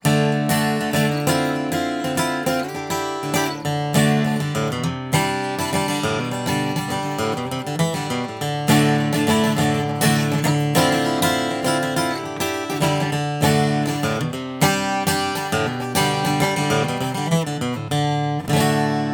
Spaced Pair Guitar

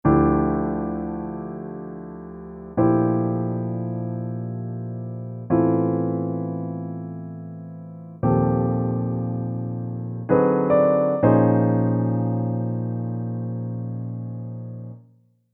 Chords

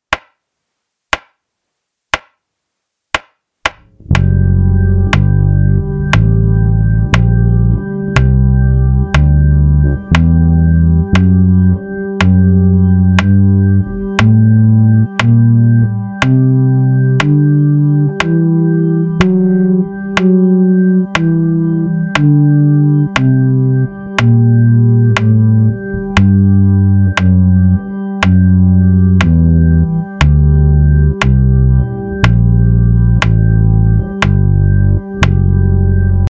Super Locrian, bass; a bassists guide to modes

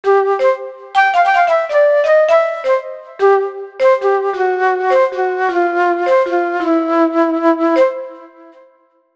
Flute n Marimba

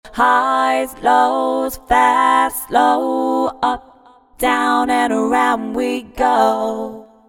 Low parts toward center

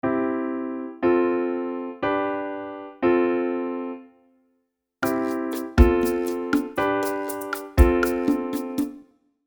Montuno Chords

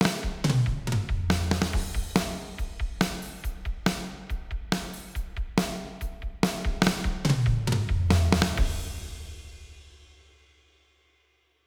Swedish Metal Ambi Hall

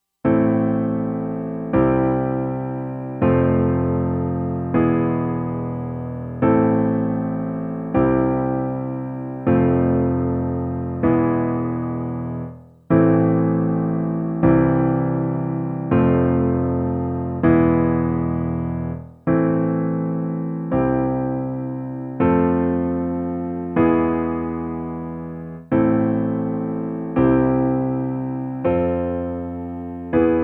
Chord progression with 4 note chords

